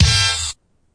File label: orch hit; Punches Hits Discoblasts; single hits